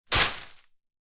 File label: leaves land